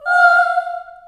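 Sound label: F4 Choir Children Ohs, Choir Acoustic & Synth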